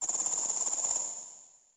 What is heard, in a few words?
Shiigan Roar; Trading Battle